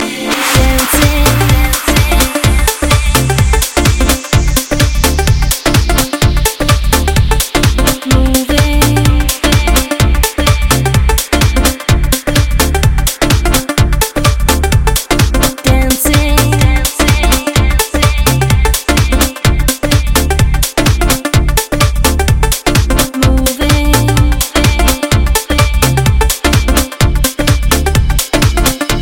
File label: Hammond